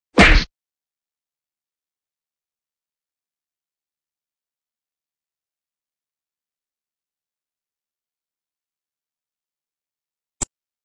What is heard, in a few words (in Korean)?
Fights, 싸움-전투; 헐리웃특수효과음